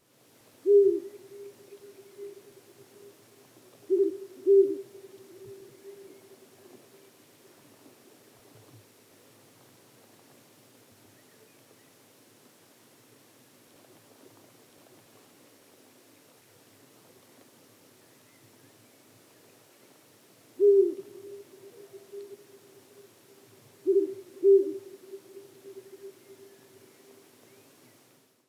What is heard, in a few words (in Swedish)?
Slaguggla